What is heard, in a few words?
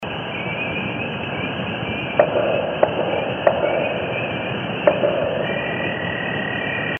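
GUNFIRE BEHIND HILLS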